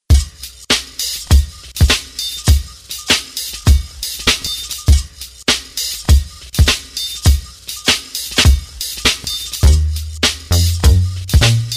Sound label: Crunchy Space